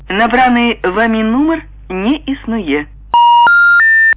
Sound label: diallog error